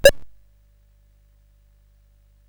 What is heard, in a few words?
sid CSG8580R5; hvsc